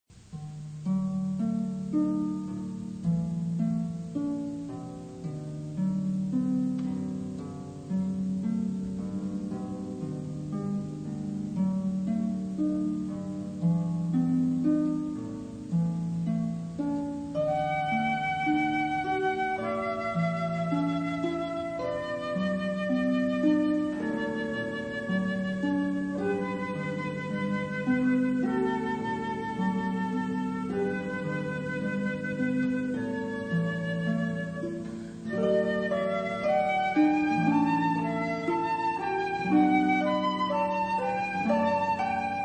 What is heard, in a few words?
Canon in D Pachelbel, flute harp duo